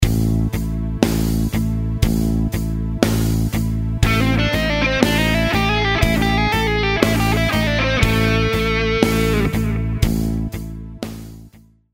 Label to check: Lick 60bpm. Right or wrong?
Right